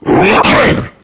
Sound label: melee, voice attack; video game; source engine; left 4 dead 2; charger